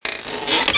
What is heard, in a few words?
gate Open; merge-dragons-2